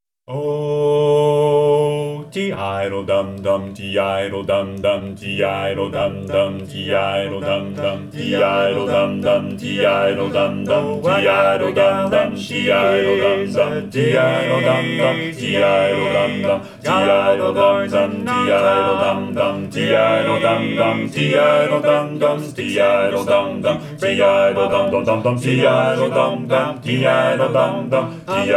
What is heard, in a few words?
bass predom; 2024 spring show